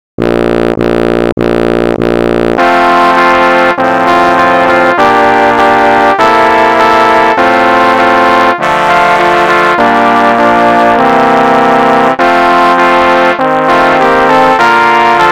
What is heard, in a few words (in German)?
Choral